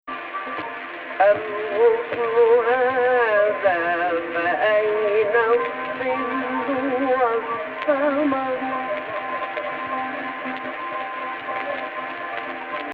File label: AHH, Egypt Rast